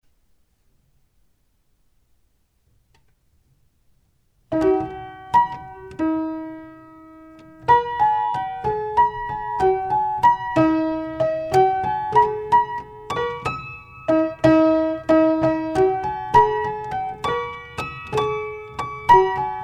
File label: Piano Concerto